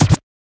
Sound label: normal hit normal